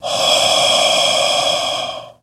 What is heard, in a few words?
Werewolf Breath, Airy, Closer